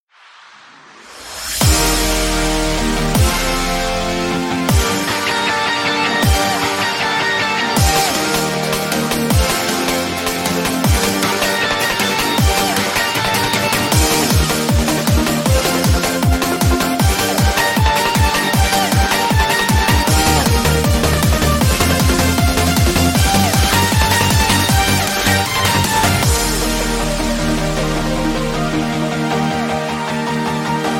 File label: eurobeat remix